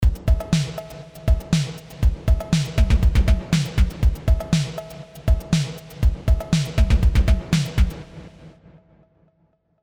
CMI V Beat Rev LX-24 Percussion Hall

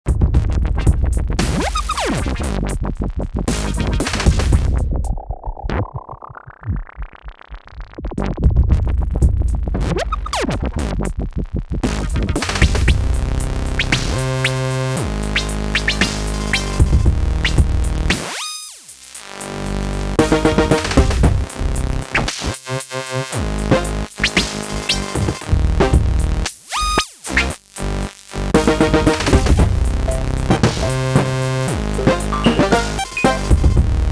Tempest

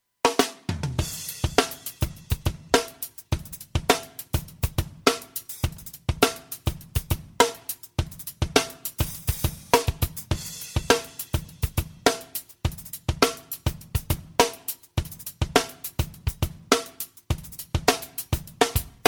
Elysia XFilter Drums Treble Boost

